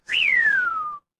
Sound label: cartoon slide whistle